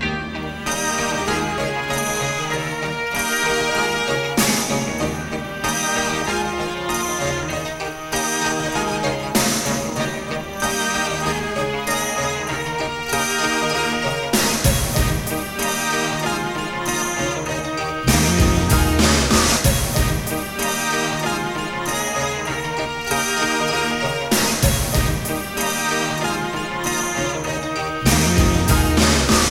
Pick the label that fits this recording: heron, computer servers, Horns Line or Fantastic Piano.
Horns Line